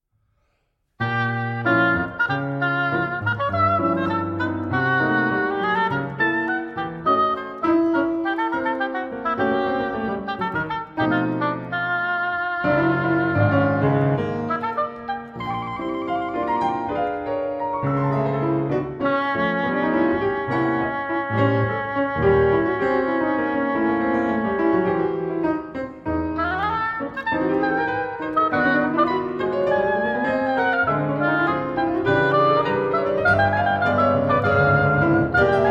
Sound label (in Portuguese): Sonata Para Oboé e Piano I. Allegretto